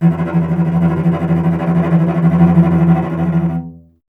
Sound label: STR VC E 1, Cello; Miroslav Vitous; Solo Instruments VOL-1